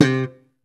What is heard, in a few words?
POP C3, Bass Electric